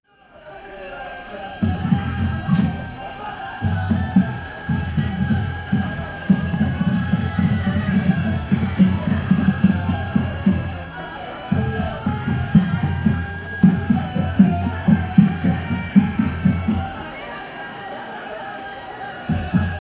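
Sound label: indian wedding crowd ambience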